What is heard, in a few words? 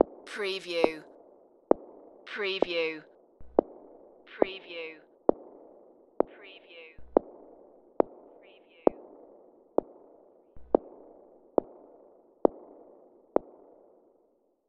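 Footsteps Hallway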